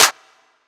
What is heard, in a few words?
Clap